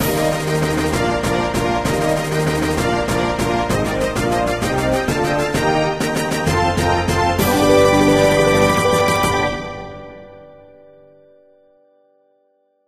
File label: Fanfare; MapleShrine